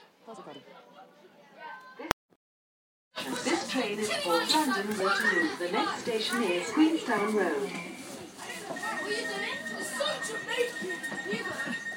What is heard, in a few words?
excited school group on my morning train